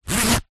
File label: foley rain jacket zipper; zapsplat